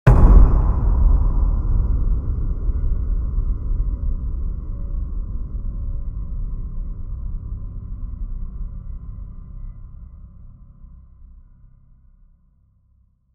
Jumpscare, Horror; Horror Sound Pack

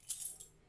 key pickup

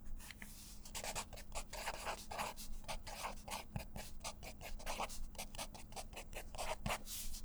Fine Felt Writing, Books, Paper, Writing

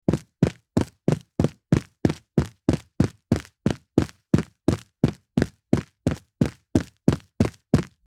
Carpet Footstep; horror; horror sfx vol 1

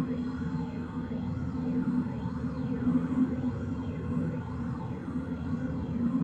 loop ambient, sci-fi; sci-fi sfx

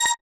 retro beep; sci-fi sfx

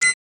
beep, sci-fi; sci-fi sfx

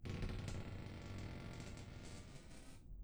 charge; abstract; Free Sound Assets

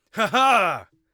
amused, Warrior Voice; Warrior Voice Pack

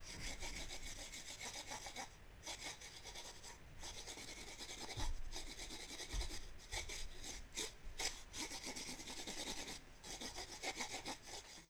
writing

